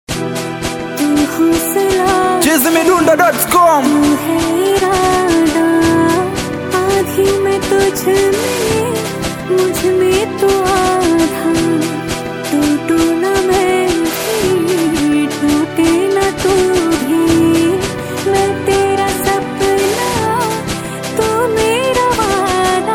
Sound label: Type Beat Singeli